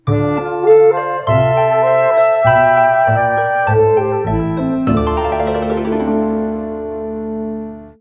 Sting

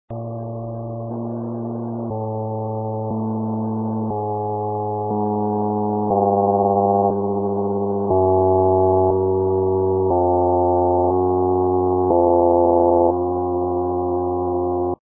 trombone

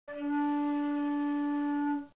D sharp4 baroque, flute

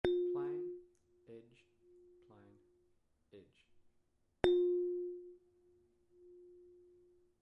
bellplate plane edge plane edge; bellplates

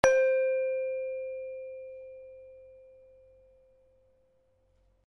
equilateral plate second partial, bell plates

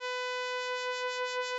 vib brightness 0.5, vibrato

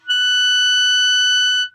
G sharp 6, clarinet

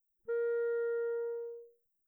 accent barrel, clarinet articulation

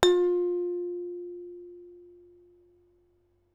large plate soft rubber hammer, bell plates

